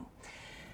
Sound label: Birds